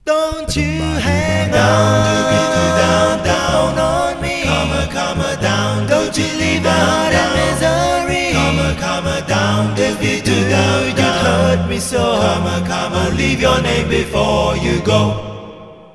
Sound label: break